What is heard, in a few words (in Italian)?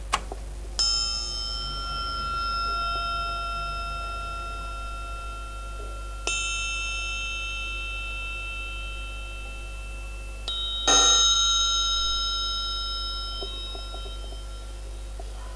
triangolo